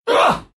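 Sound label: pain, Russian; dota2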